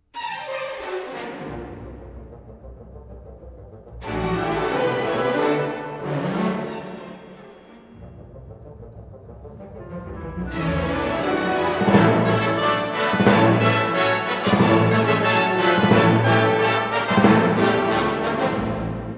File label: Allegro Di Molto; Classical; Elgar, Edward William 1857-1934; Enigma Variations - Cello Concerto - Serenade For Strings